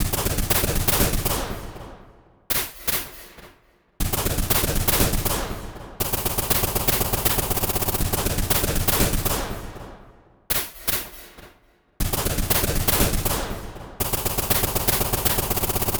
Percussion, Trance NRG, Drums

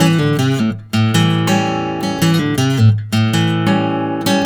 bari riff, blues guitar; ILIO; Fretworks; 108 BARI RIFF

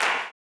CLAP, blues & soul; ILIO; Vocal Planet

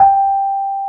AMB ROAD, Keyboards Old School

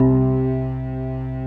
Fender Violin, Fender Pad; Optical Media International; Sonic Images Library

